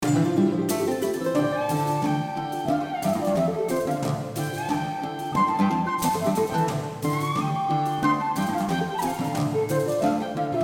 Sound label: Low Whistle, Irish Low Whistle